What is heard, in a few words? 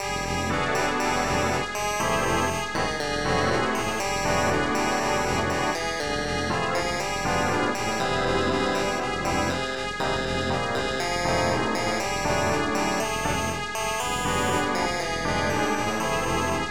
Bossa Blues; Assorted MIDI Blues